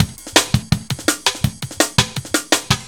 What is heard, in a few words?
Connected Break, 7B, Jungle Breaks; Blu Mar Ten - Jungle Jungle - 1989 to 1999 Samplepack